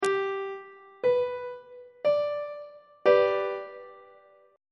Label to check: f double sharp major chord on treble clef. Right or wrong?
Right